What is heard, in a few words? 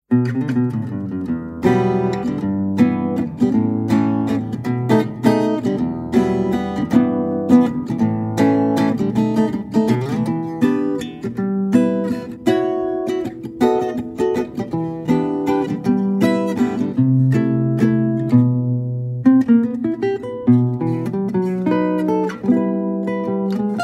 Waltz